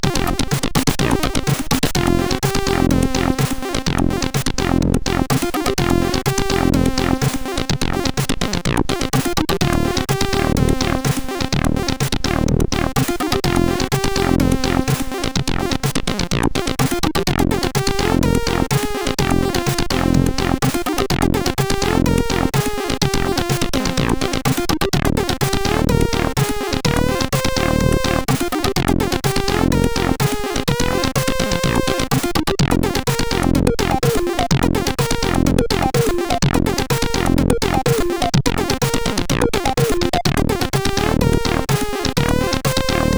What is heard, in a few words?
tune, CSG8580R5; Magia 2; hvsc